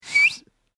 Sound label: Short Human Whistle; Whistling Sound Effects; Andrew Fleming